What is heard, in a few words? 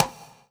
Roland SC-88 Mute High Conga